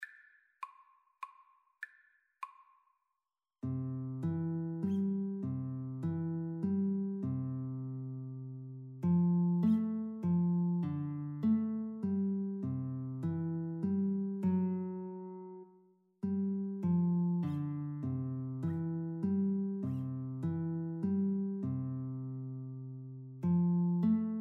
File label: away in a manger, Guitar Duet